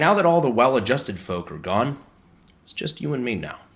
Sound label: folk